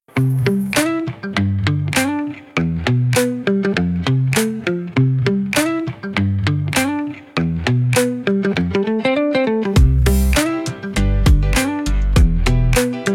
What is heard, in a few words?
Pop